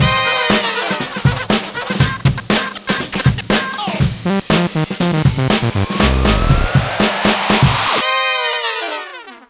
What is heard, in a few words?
Intro C min; Subway Surfers City; Android, iOS